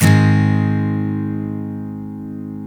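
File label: steel G# major down, CHORDS STEEL, Guitars; Spectrasonics; Hans Zimmer Guitars VOL-1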